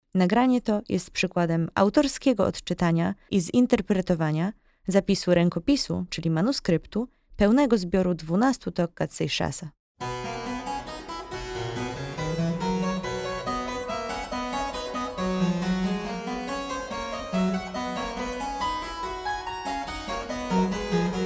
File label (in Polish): TOCCAT na klawesyn solo